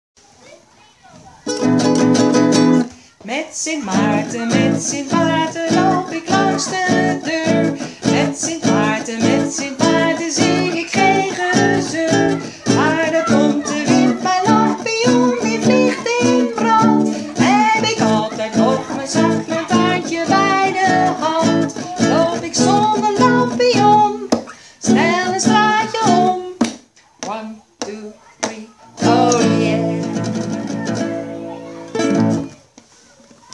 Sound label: Blues; Sint Maarten